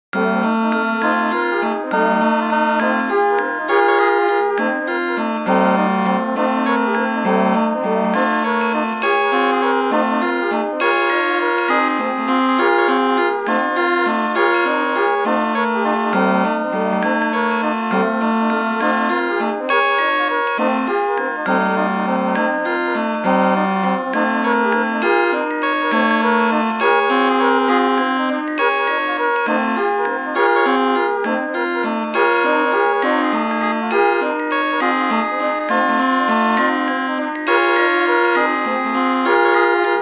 wonderful caucophony

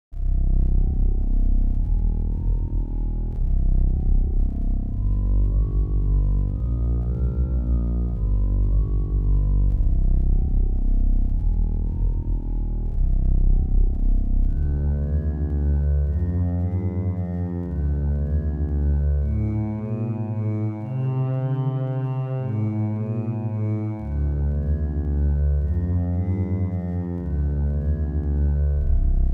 disturbed sleep, fractal music; Multiple seeds

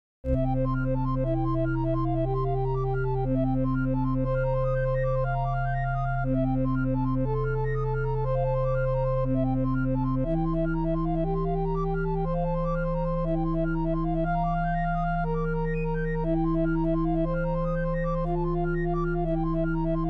recorder quintet

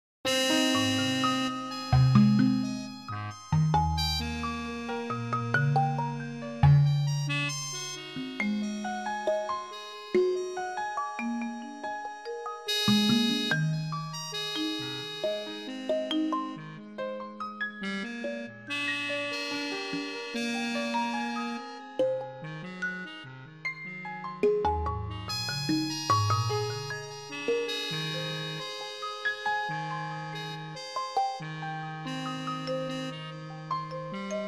fairground tune, fractal music

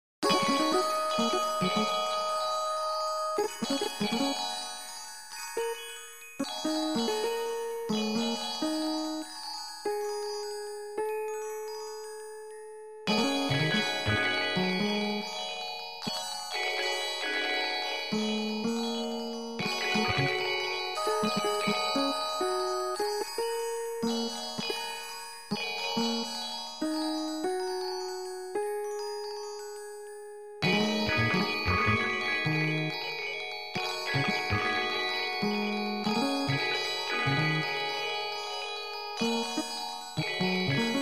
sparse scale jazzy; fractal music; Sloth canons

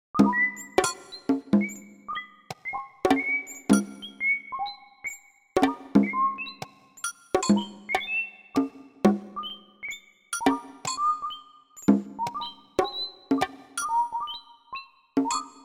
bird calls with Afro Carribean percussion; fractal music; Transforming seed

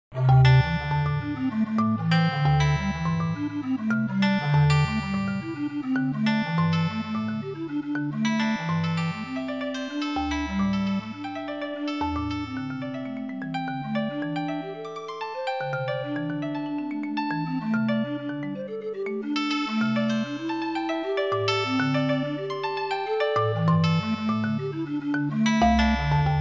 comma scale steps

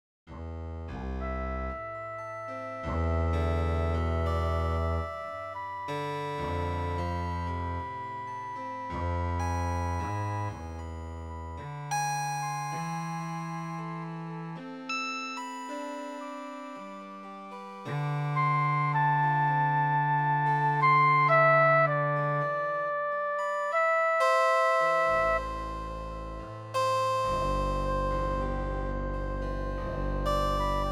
Endless Early Baroque Movement

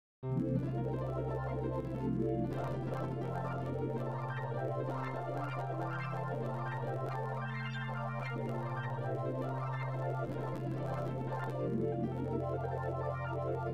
arabic 17 tone maqam ussaq

